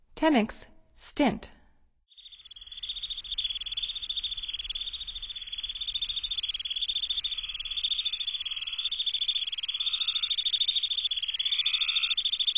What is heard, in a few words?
Temmincks Stint, Songs of Birds of North America; Stokes